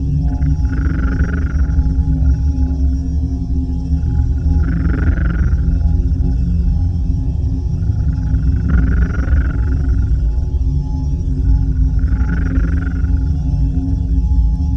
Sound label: ai crisis room energy; Stellaris; synthetic dawn